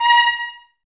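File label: Brass trumpets A#, Mute; Miroslav Vitous - Woodwind Brass Ensemble; Tps Mute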